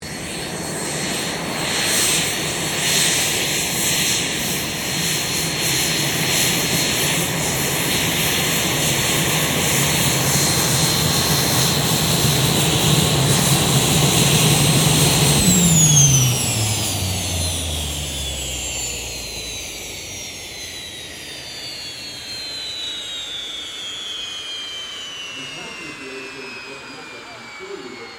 AIRCRAFT CESSNA A-37B DRAGONFLY SHUTDOWN, Jet Fighter /Bomber; Airplanes; Vehicles